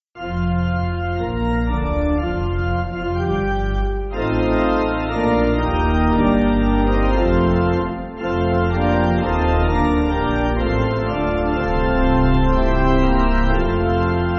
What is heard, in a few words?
Bell